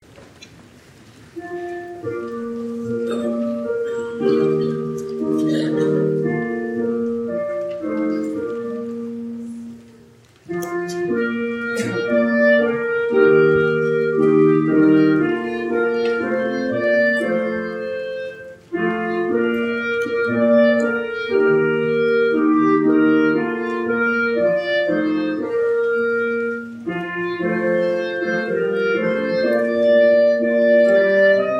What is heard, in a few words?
communion, instrumentalists